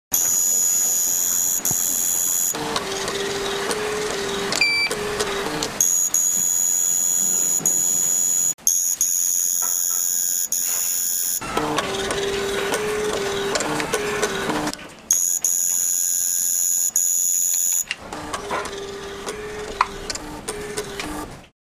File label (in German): Photometer